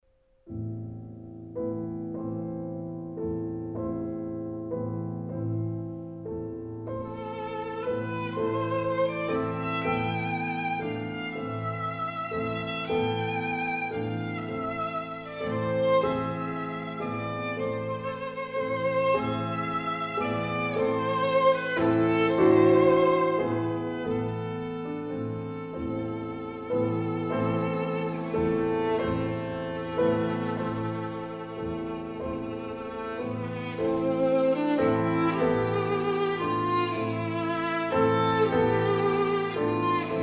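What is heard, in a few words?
violin sonata; stojowski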